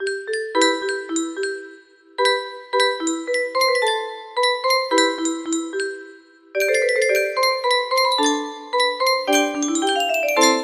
melody with high notes and flourishe, music box melody